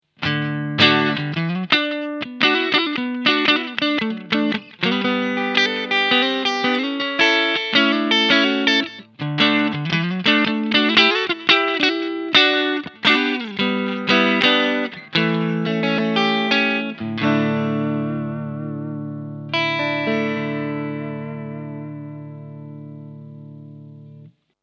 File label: Two Notes Torpedo Captor clean XLR